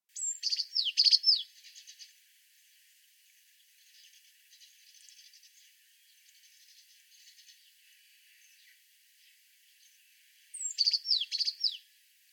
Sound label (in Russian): belaya lazorevka golos